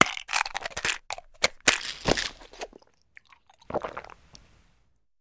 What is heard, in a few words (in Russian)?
nepriyatnij chavkayushij zvuk zhevaniya chipsov i zapivaniya ih napitkom